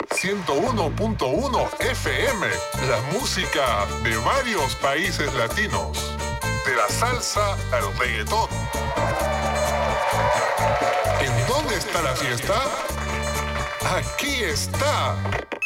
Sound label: Radio Car Latino Jingle; gangstar rio city of saints